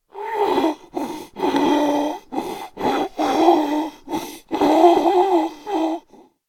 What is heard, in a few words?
idle, zombie; DayZ-Epoch; dayz sfx